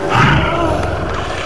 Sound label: scream